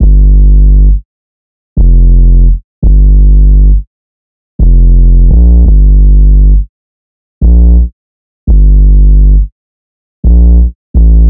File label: 808 loop 170 Gm; Slate Digital BASSHOLE Sample Pack